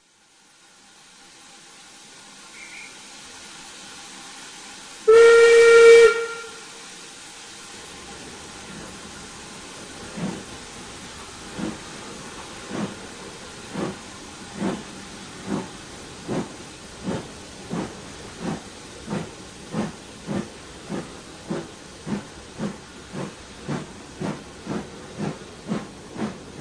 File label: Guard Whistle train departs; Children of the Blitz